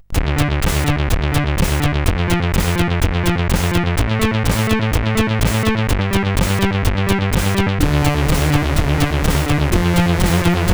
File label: Funky Music; CSG8580R5; sid